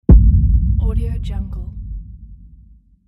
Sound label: sub bass hit